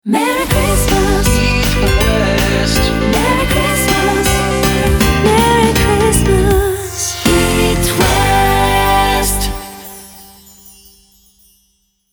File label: Xmas; Hit West